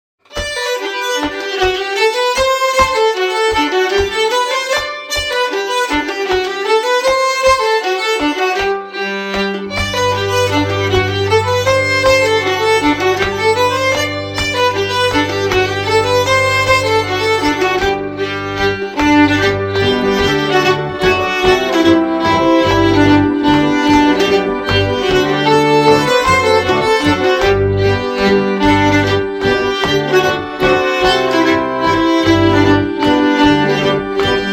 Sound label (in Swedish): Hambo-Mazurka från Transtrand, nyckelharpa